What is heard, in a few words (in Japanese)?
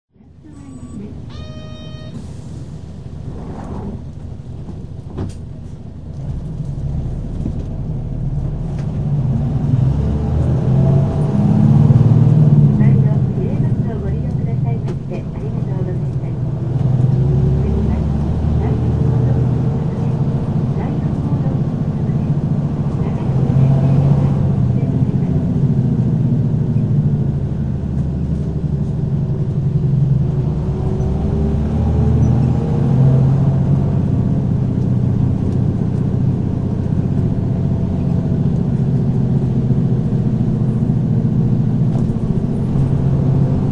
走行音, 日野 P-HU235BA; 仙台市交通局; 霞の目; 原町一丁目・総合グランド入口→第四合同庁舎前